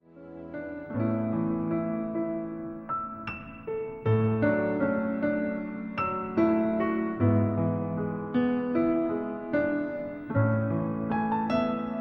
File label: Piano